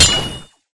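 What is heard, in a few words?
Impact Pulse Blade Bubble Shield; Titanfall 2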